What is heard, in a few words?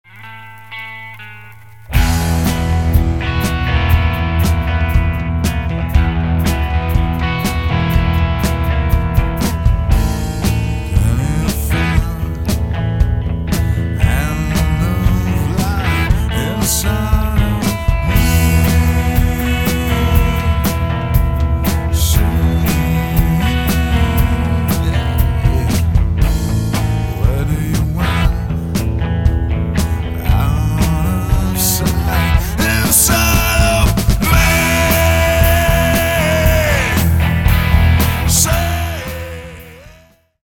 rock